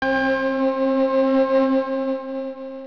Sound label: Startup; Photoframe